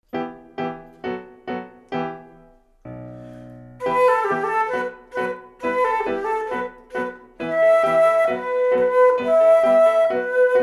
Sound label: flute